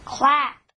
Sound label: clap